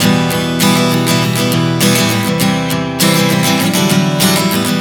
Em-C-D 100 bpm, acoustic guitar chord progressions